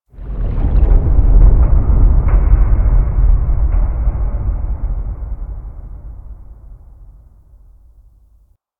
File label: Cave Underwater Rattle; Ambience; Warframe; Stalker Cave